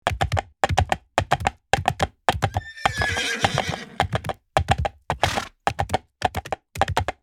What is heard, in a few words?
Fast galloping horse with neighs